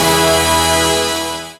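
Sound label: synthesizer; Best Service Gigapack 1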